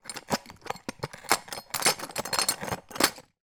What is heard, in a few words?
foley glass broken bits movement in plastic dustpan; zapsplat